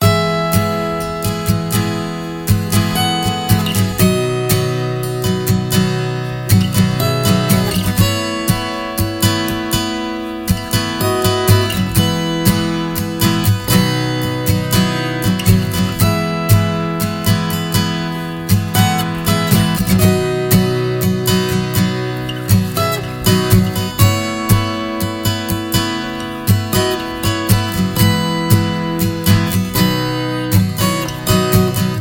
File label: Acoust Happy, loop, ringtone; MIUI14 and HyperOS 1.0